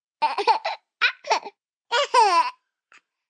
Giggle Baby, Laugh, 1 baby, 1 yr., happy, short, human voice, child, kid, infant; Laughter